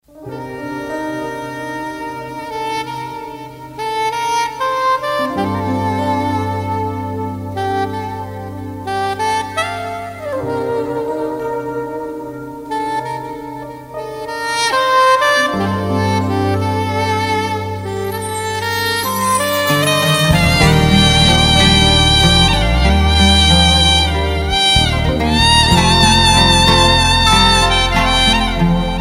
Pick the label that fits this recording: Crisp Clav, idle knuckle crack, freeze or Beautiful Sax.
Beautiful Sax